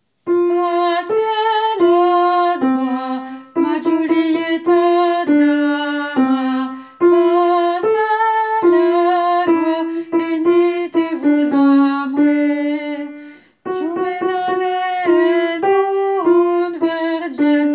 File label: alto; pase el agoa